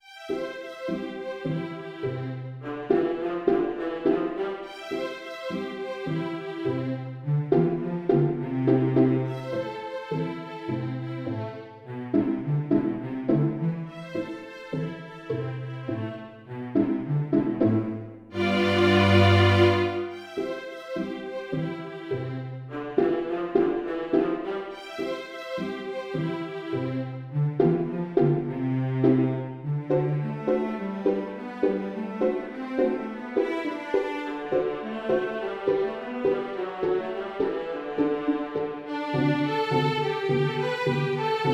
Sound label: Pony Trot string orch